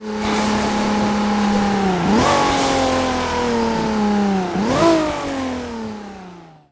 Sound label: throttle off, vehicles; gmod; tdmcars; supra